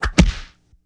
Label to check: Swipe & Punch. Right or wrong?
Right